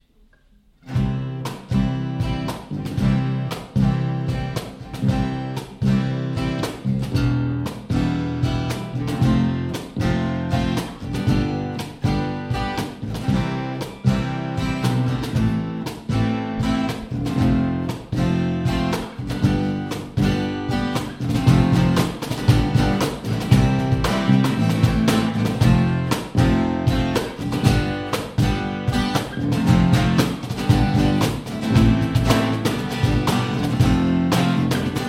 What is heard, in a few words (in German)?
Botschafterlied Instrumental